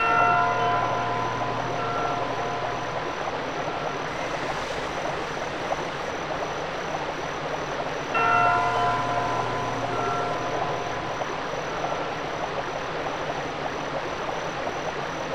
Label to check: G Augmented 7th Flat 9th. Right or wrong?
Wrong